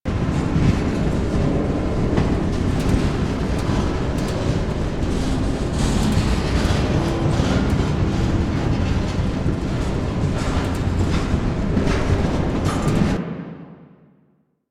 Elevator Move Loop; Warframe; Ambience; Venus Open World; Venus Solaris Elevator

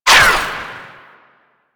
Ranged Weapon Whizzby; Warframe; Necramech

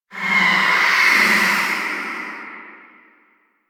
Specter Vocal Pain; Enemies; Warframe; Priest Quest; Rell Specter

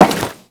Crewman Step Default, Footsteps; Warframe; Corpus

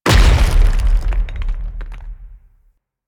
Thumper Land Heavy B; Warframe; Grineer

